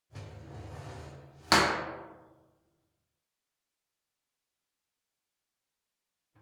prison; Inquisitor 3

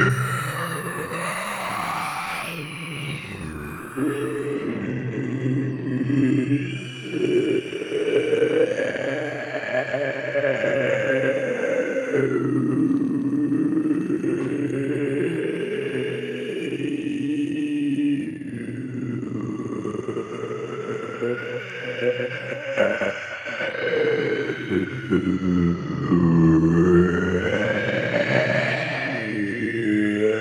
zombie voice; DeadFright

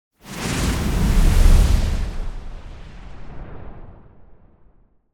Whoosh Strong Emission - Low; Hollywood Edge; sound designer's toolkit v2

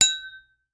Glasses Hit and Ring; Crashes; 1001 Sound Effects

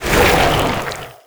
Plant Giant Thorn Vine Grow; SFX Kit; Foley - Misc

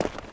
dirt; firefight

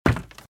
Footsteps - Wood - Creaky - Run - Right

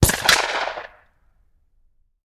Bullet Impact; Sound Ideas; Guns

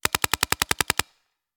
Paintball Gun; Sound Ideas; Guns

